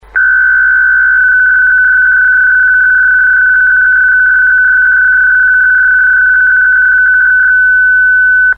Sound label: PSK-63, digimodes